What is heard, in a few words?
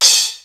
Crash